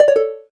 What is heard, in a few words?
gayageum, keytone